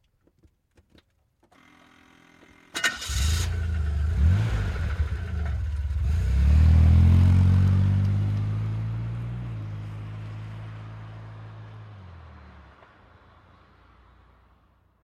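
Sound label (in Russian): zvuki startera avtomobilia, car starter sounds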